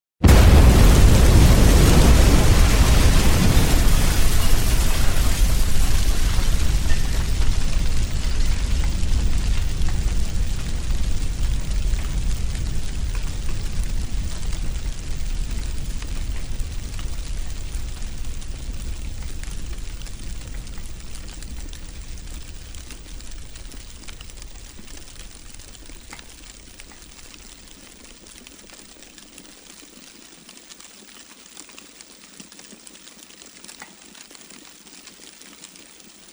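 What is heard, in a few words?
debris burns for a long time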